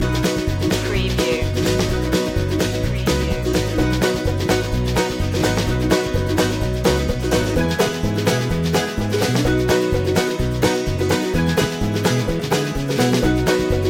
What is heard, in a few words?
cowboy hat loop